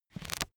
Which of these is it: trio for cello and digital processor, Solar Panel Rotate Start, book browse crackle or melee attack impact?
book browse crackle